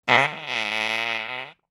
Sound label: Farting Long Fart; Human; SFXSource